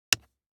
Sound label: DICE on Plastic, Drop, Standard, 1 One Die; Samples; ArticulatedSounds; DICE Sfx Party (Extended)